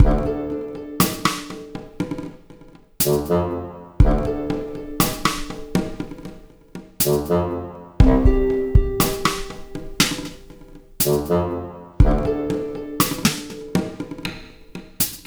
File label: Confused Loop